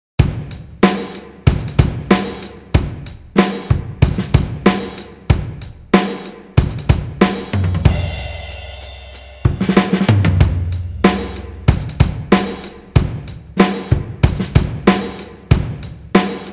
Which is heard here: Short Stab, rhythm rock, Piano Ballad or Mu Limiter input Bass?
rhythm rock